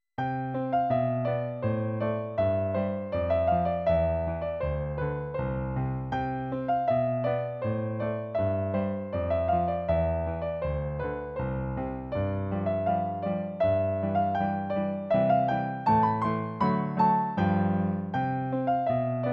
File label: DECK THE HALLS DUET